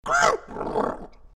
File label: Utahraptor Roar; dinosaurs